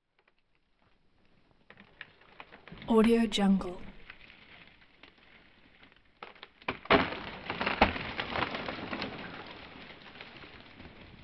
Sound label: wood drop cracking breaking; prosoundfx